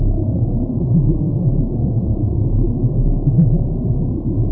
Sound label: drone throb